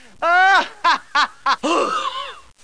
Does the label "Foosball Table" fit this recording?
No